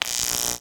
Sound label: Electric Shock Hit